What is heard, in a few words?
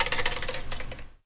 shaking this thing; Weird and Interesting Hits; single hits